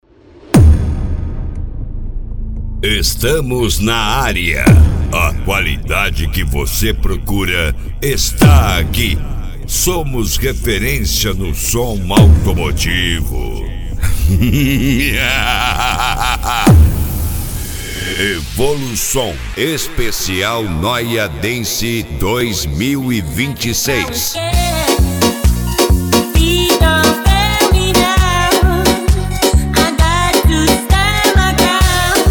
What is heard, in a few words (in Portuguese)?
noia dance